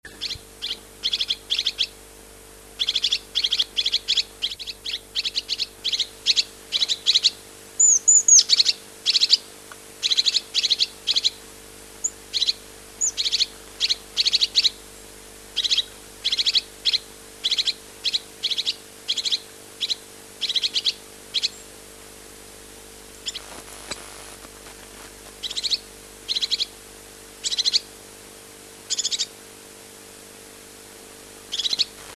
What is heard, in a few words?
Lophophanes cristatus